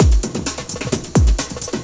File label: Beat, Drums & Percussion; Electrocution; Beats